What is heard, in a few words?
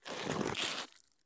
turn asphalt; Escape from Tarkov